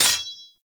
Melee Sword Impact Generic; Warframe; Swords

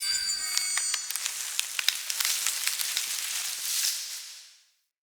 Thorn Blade Attach; Powers; Fey

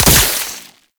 Proj Explosion; Warframe; Weapons; Tenno; Oraxia Flechette

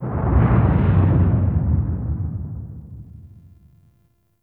Sentient Vessel Step Delay, Enemies; Warframe; Tau; Movement

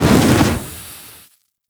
Lizzie Fire Shot Loop Stop; Warframe; weapons

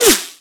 Bullet Whizzby; Warframe; Weapons

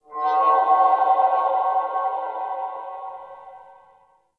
Exterior Space Whistles, Ambience; Warframe; Railjack

